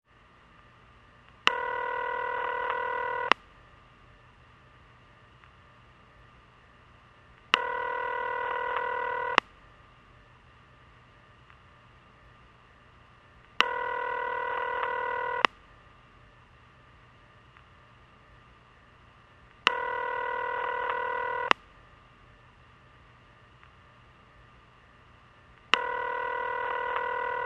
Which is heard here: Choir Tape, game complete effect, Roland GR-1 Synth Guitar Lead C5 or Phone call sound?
Phone call sound